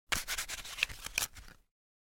shuffling cards

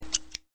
scissors

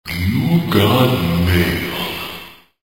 Mail

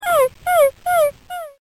whimper

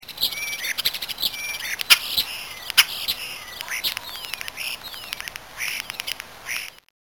starling

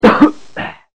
cough

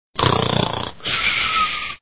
Snore

